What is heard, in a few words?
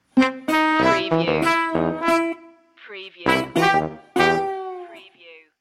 stinger